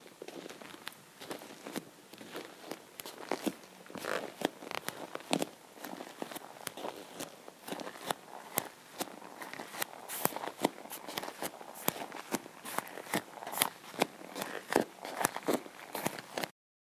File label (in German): Barfußschritte Wohnzimmer auf Laminat; menschliche laute